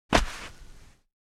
Footsteps single step on sand

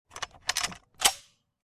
Famas G2 reload

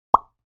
Cartoon funny pop

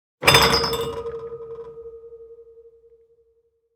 Glassware impact with shaking

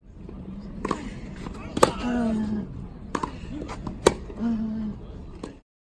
Tennis court ambience hard court

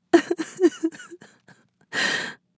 Young woman sobbing and whimpering

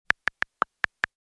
Smartphone texting style short

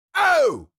Cartoon lion voice shout scream short

